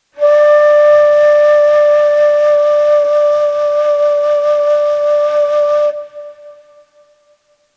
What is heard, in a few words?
Chargah, Neysample